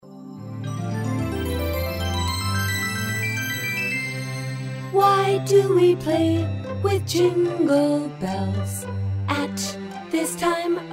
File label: shake the bells